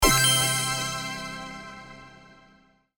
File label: Casino slot machine small reward